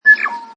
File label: Tui song, Exotic bird - New Zealand bird Tui, chirping, singing, cackling.; Birds; Animals